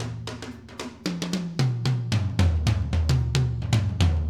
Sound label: Acoustic Live Drum; Platinum Audiolab